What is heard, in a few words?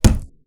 orange hit hard; 磕头